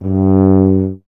BRS TUBA, Solo Instruments; Miroslav Vitous - Solo Instruments VOL-2

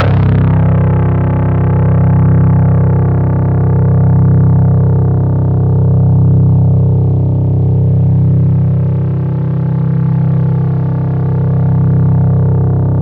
C1, Synth Line; 90 s Sample CDs; NiceBeats; SynthLine VOL-33

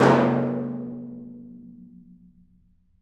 Af. Hand Drm, Ambient

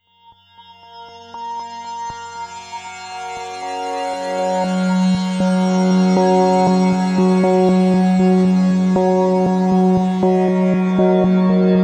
Melodic Solo; Sonic Foundry (Sony Creative Software); Slow Silhouette

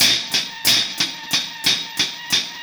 Cymbol Particle; Particles

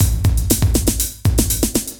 CB LOOP; Zero-G; Chemical Beats

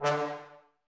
brass, Woodwind Brass Ensemble

